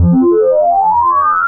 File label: Sweep Up